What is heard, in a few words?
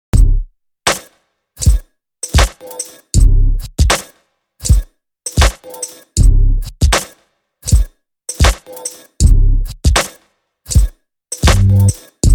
Harp Beat